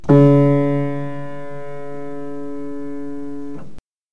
Piano

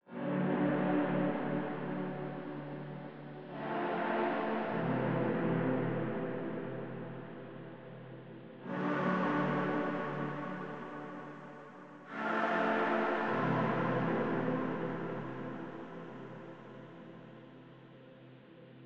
Castle Melancholy

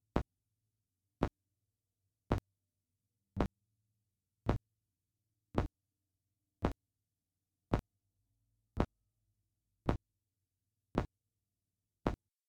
Motion Tracker Blip

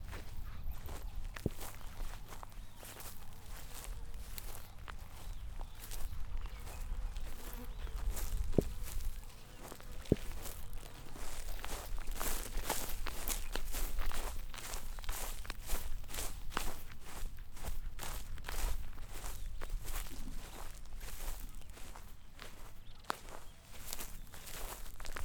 Walking In Field

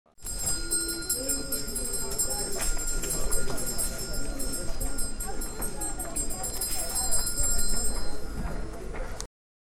Handbell

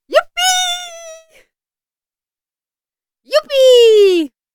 Woman Yuppie Joy Voices

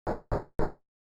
8bit Knock Knock

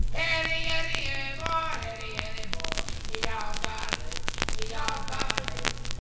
Vocal

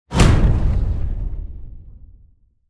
Impact; Sound Design Elements

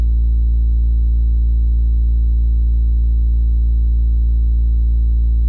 Tr 808 Bd Feedback

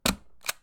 Stamp

